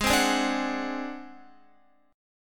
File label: Ab 7th Flat 5th Ab down Guitar; Ab7b5 strummed; JGuitar